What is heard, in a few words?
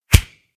hit